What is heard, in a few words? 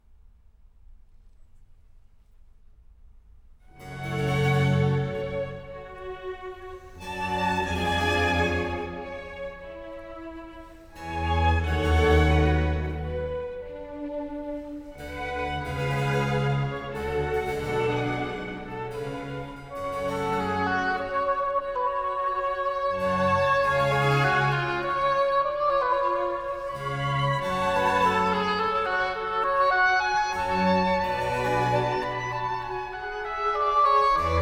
Oboe d'amore Concerto in A Major; Bach; J.S. Bach